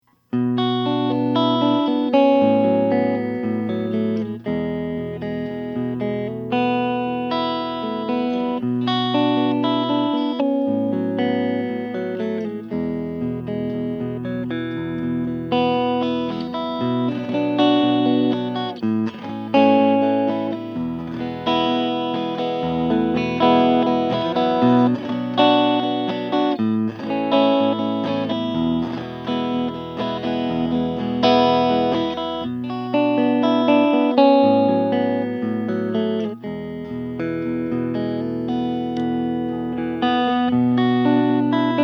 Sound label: gretsch 6120 orange